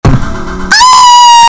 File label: real man scream